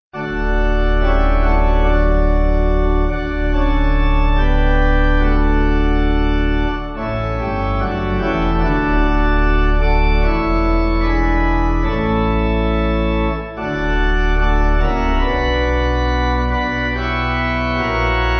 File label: Pipe